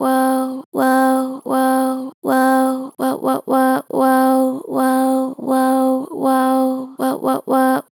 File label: Gentle Vibrato "Woah Wo", Vocals, Natural Vibrato Gentle; Harmor Vocals